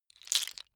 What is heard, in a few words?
bone small handling right